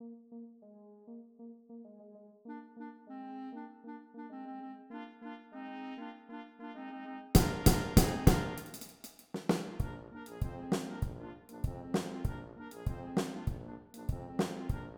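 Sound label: percussion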